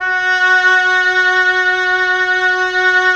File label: WND OB F, Oboe f; Roland - LA Composer vol. 4